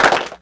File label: Clapbox Pitch 5, clap; mpk mini presets; Ableton Foundation FreePacks